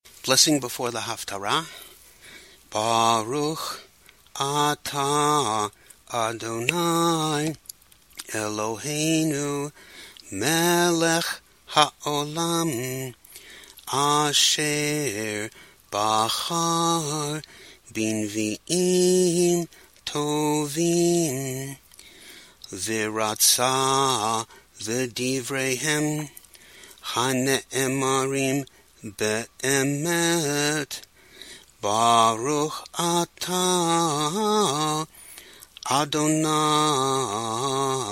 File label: Blessing before Haftarah